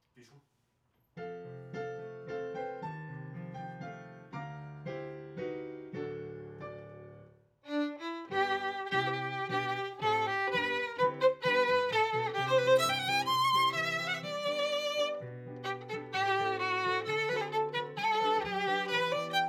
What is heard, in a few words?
Viola C; The way of the cross